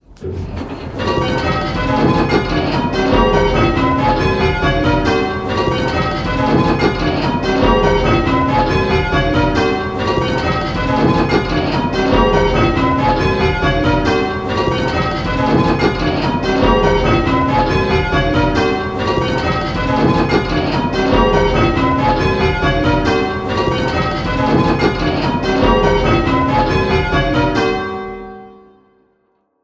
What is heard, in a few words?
Striking 10-Bell Call Changes, Queens; Pebworth